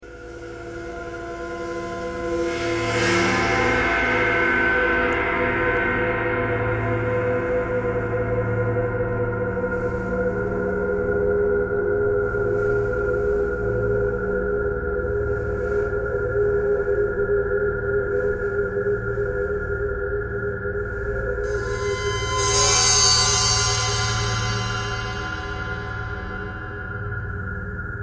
ice bowed cymbal traffic k core birds thread simple; bowedmetal